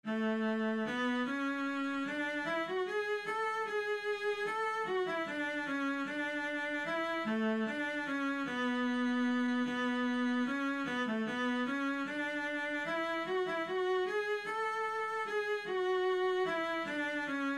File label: minuet Vla, viola